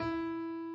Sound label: key